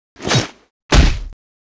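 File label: Mob attack; Monster; 흑룡방; 흑풍장주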